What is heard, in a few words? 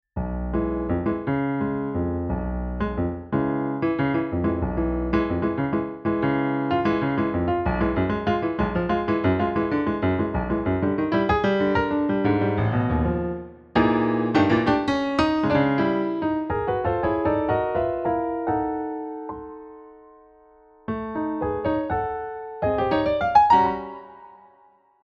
Danza Insalata Tango